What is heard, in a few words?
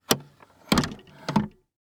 Electronic Machine Wood Record Player Opening; AdobeCC